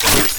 Shotgun Reload; Pax Duviricus

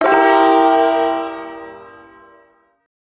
Ersatz Shawzin Phrygian Chord C; Warframe; Duviri Ersatz Shawzin; Chords

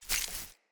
Grunt Foley Land; Enemy; Characters; Corrupted Sproutfolk

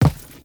Crew Footstep Default; Warframe; Characters; Railjack Crew; Steel Meridian

